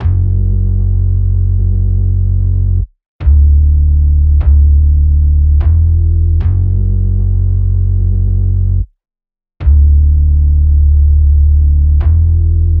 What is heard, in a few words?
Kit Synth Bass F#m 150, Trap; HipHop; Ghosthack; Gone